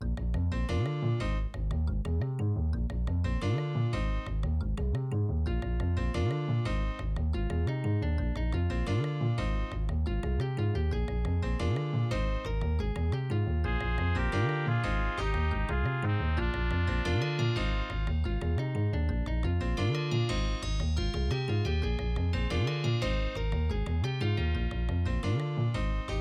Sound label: level1, AZTech SoundGalaxy Waverider pro 32-3d; soundcard test; Hocus Pocus